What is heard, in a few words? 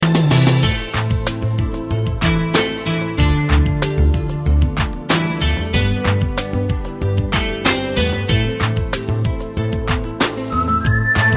instruments; Valentine Tones